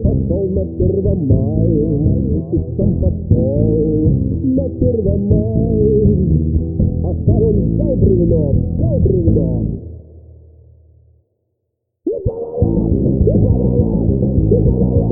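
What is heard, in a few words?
funk surprise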